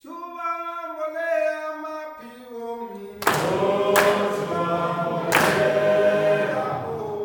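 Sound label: CLAPPING CHOIR; Spectrasonics; Heart of Africa 2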